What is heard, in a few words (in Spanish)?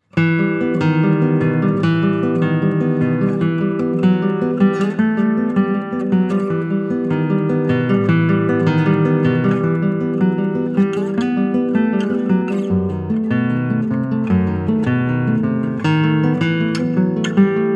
Tango